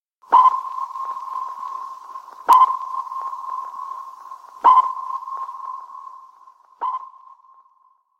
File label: Sonar Pings